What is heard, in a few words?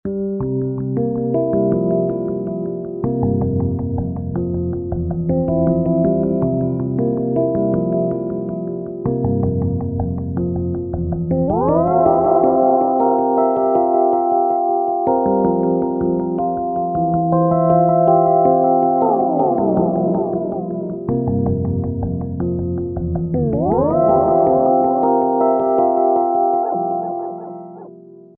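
Electric Piano Phrase, Roland V-Synth; Factory Patches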